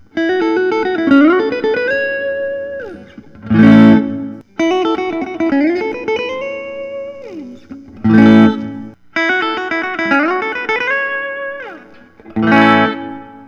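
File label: lentz dl 90 flame top custom sunburst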